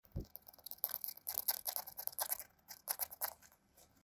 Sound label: Chattering